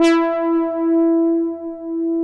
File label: OBERHEIMS